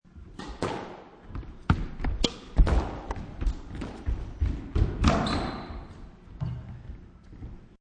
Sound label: Sports Squash Rally; Lynne Music